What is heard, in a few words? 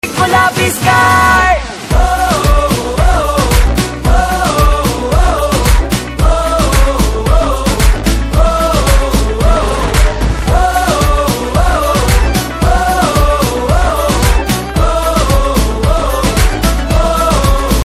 BG Humming Tone